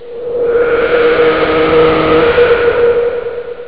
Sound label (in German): stay getoese; ST-XX Amiga Soundtracker Sample Packs